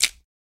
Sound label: Bone Crab Shell Break